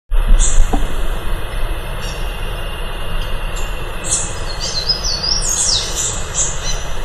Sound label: Basileuterus culicivorus; PP Salto Encantado